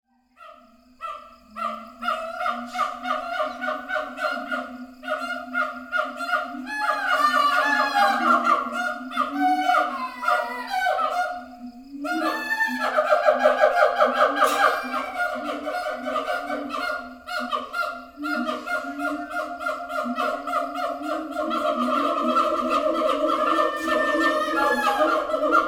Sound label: Indonesian Monkeys